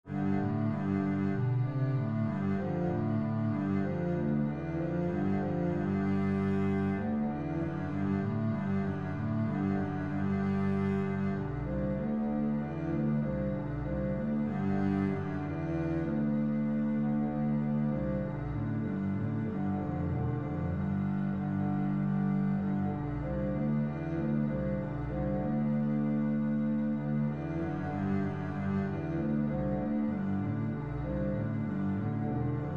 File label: Columba, string bass